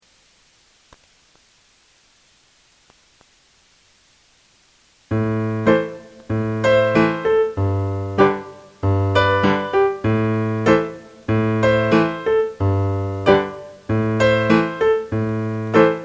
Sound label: piano Am and G chords Medium; Muilean Dubh